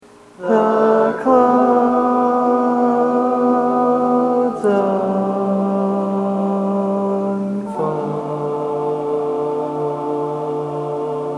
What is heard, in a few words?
Lead, barbershop tags